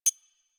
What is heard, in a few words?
UI K Drive Point Add; Warframe